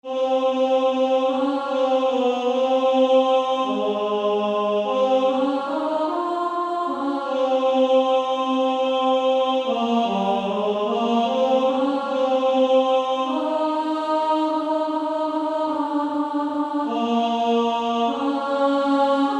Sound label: Female Choir